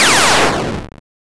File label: laser